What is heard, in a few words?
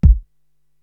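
bass drum Dance, Korg TR-Rack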